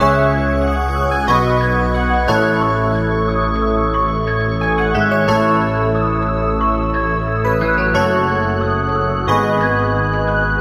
keys broken hearted rnb, sad loops

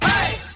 "hey" SUNG; Voice; ooh ahh

